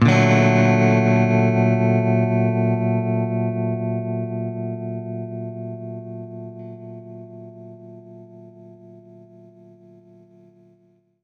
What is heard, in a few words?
Baritone Chord G min9 maj7, Guitar Chords; undercover samples